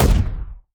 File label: explosion grenade; Epic Toon FX